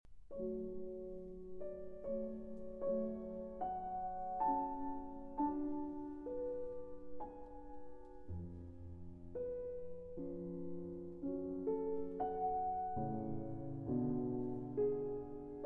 Piano Concerto No. 23 in A Major, K. 488 II. Adagio; Mozart